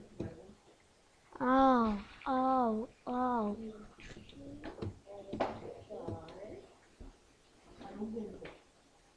owl

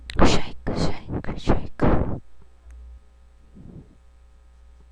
shake

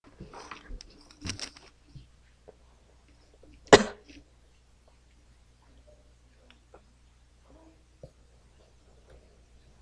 frog

